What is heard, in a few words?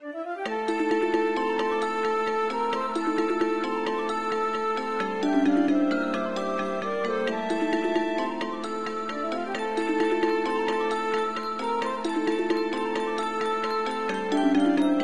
dungeon; MapleShrine; mkxp; gameasync